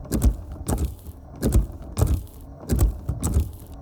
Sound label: Exterior vipers on fast LOOP, Land Rover Defender TDI 300; Ivo Vicic